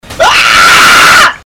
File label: scream